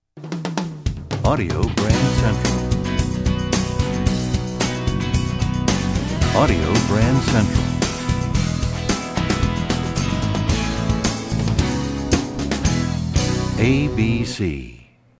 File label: Desert Wind, radio jingles